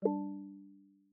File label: navigation forward-selection; Google; Material Design sounds